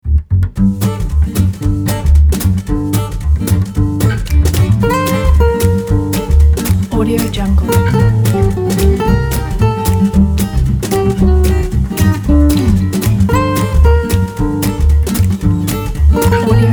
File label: french guitar